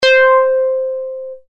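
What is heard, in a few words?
synthesizer single space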